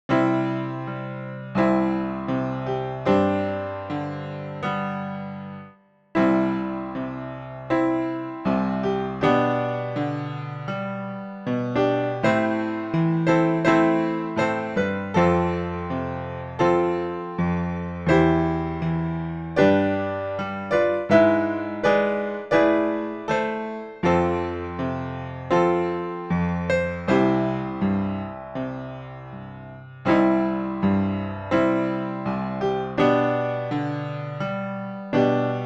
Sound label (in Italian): Ti seguiro base pianoforte